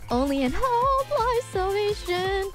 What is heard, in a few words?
Singing; IRySinging